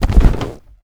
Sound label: padding land